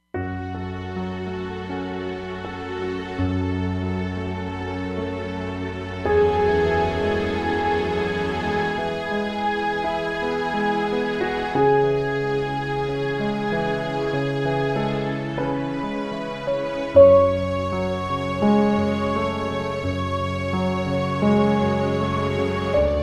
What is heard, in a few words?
Grand Hall, Roland Fantom XR